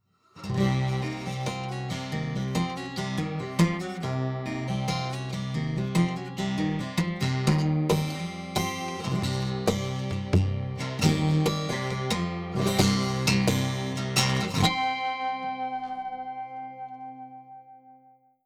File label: tap Santa Eq; タッピング奏法; ここではいわゆる"タッピング奏法"のフレーズを弾いていただきました。; SantasticEQ